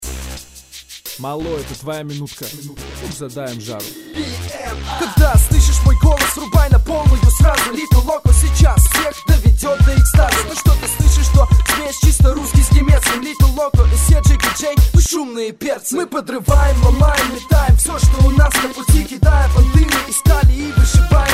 acapella

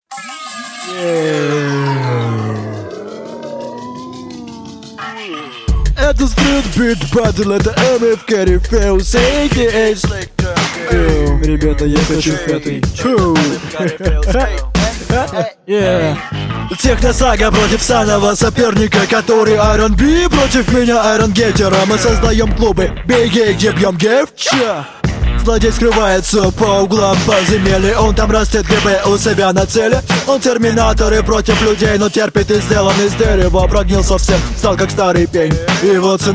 drum streetbeat

